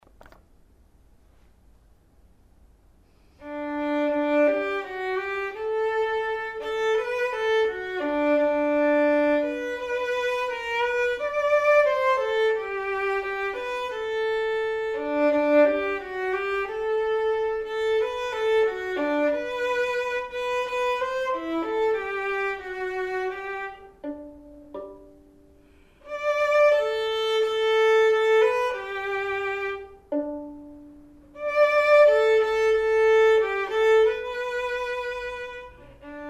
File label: perf vln